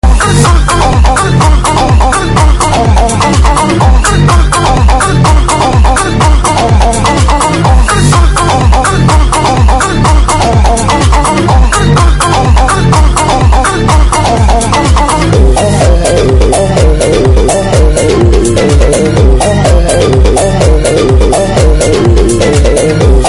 puffer rave

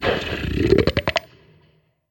chat; gonarch

